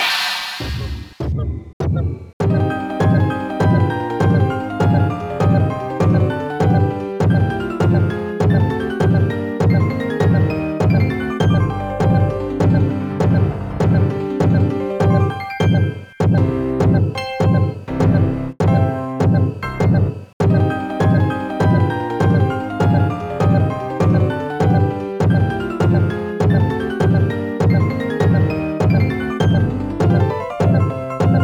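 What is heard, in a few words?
Heartbeat, game music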